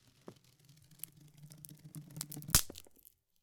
Animal - Deer Pulling Bark From Tree; Natural Variety

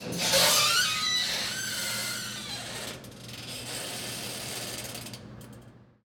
Creepy Door, Fast Metal Creak

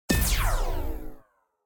Blast Laser, Sci-Fi Blaster; Async Audio

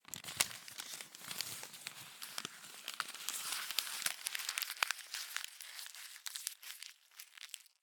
drawing, crisp, paper, notebook, crumpling; Eiravaein Sound

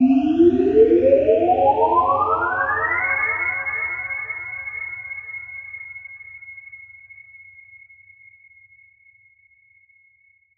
Sounds of Space; Julian Ray; Space - The Finest FX

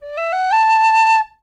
Tin whistle; MatiasMacSD; Flute

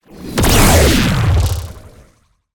Flyby Plasma, Sci-Fi Energy Weapons; Rescopic Sound

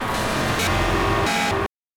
Texture Sci-fi Glitch; MatiasMacSD; Sense

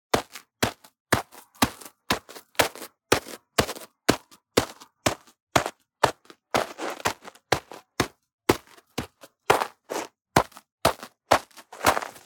Footsteps Snow Singles Sequence; Studio 23; Ultimate Footstep Collection

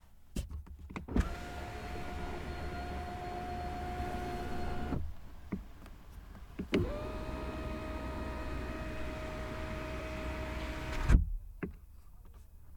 volvo xc60 window open and close; MKH8060

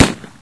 smg1, weapons; hl2

